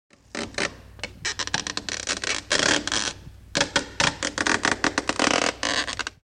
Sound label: Ice Creaks Groans